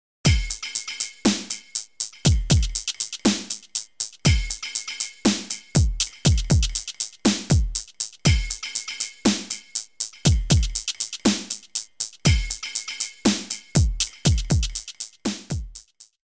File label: electronic interlude; Ninja Gaiden; xbox